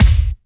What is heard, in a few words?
rich highs bass drum single hit c sharp key; kick